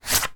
technology tablet plastic slide and pick up from table; zapsplat